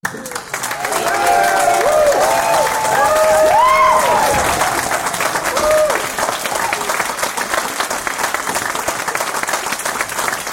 Audience Applause; beanstalk audio

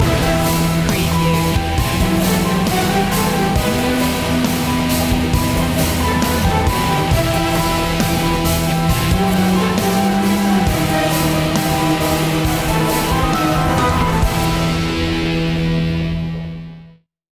epic hybrid demon

